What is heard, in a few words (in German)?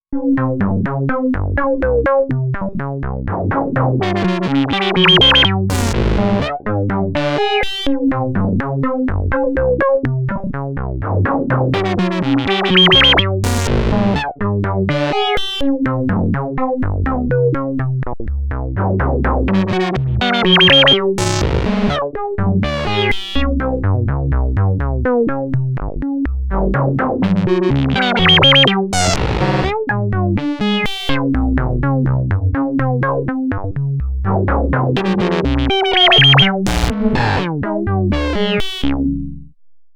Dreadbox Polyend Medusa Zufallsparameter